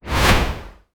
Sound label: robots